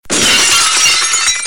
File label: Glass Smash